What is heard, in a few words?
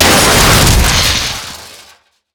wires explosion, Explosions